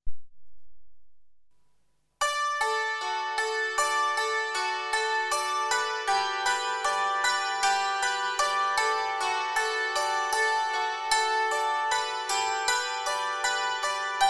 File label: Solo Sinfonia de Salterio